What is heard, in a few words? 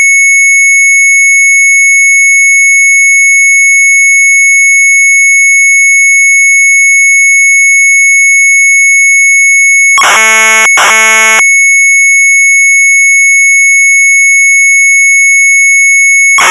Sharp MZ800 Tape; 3 VOORWERPEN; retromelsarchive